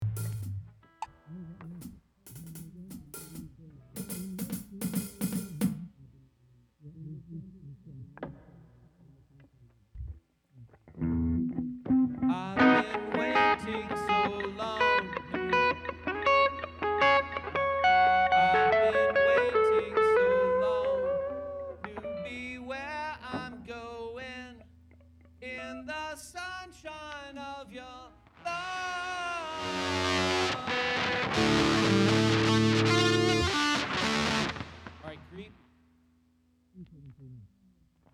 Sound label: Strings Emphasis Mix